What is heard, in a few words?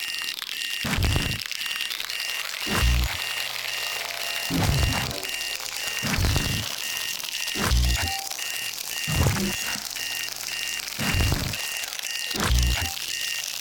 Cold Damage Loop; DriftMine